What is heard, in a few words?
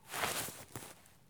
stop grass low; Escape from Tarkov